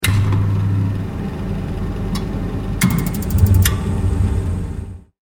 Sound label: household boiler combi on, Boiler (Small) Ignite; Gas Combi Boiler Igniting ( Domestic ); Furnaces; McKinney Sound